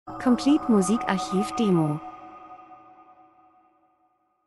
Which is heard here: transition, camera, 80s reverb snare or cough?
transition